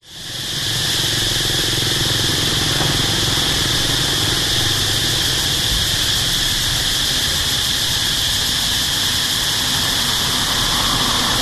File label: Cambodia Siem Reap Night Locust Traffic Pass